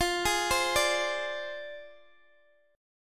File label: F Minor 6th Guitar DADGAD, chord; x,x,15,13,15,12; down; chord shape